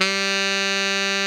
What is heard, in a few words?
TENOR MF G 3, Saxophones; Greytsounds; Philip Wolfe David Crozier's Saxophones